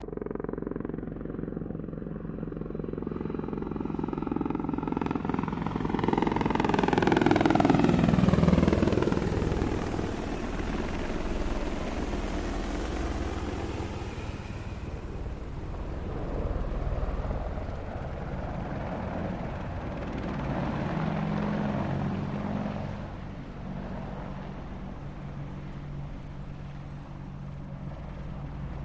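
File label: Apache Med Slow By Righ, AH-64 Apache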